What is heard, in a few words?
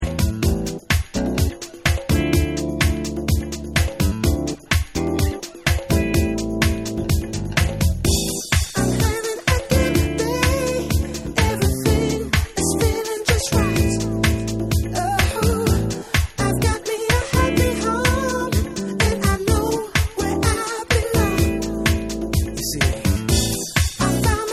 Vocal Mix